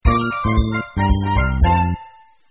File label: game start; Delicious Emilys Wonder Wedding